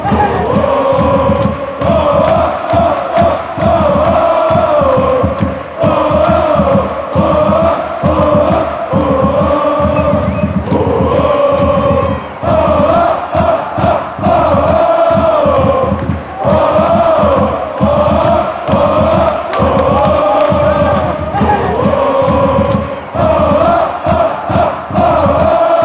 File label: oh oh, fanchants; pauli